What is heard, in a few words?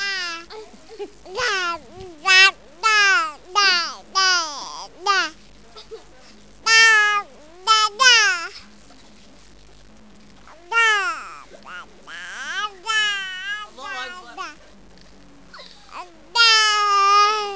baby